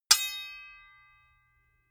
sword hit sweetener

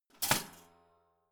toaster finished jump out

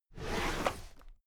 drawer cabinet paper open heavy halfway

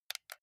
console controller xbox rb creaky press soft

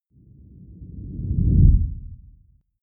whoosh low fast

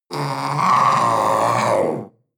panther young roar

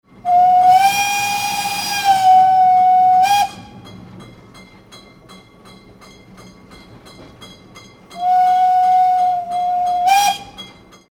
train horn old

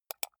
computer mouse wheel click fast soft

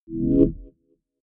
chord swell very short

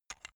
chess piece handle

